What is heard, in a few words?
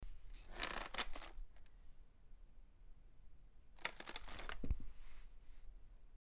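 chair sat down stood up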